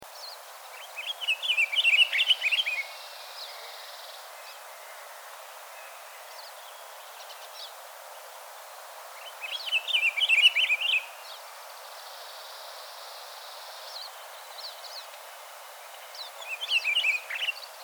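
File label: shchur voice, pine grosbeak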